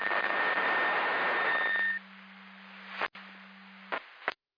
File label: Siren; Hamburg FD